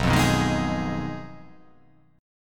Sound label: B 6th Flat 5th B down, chord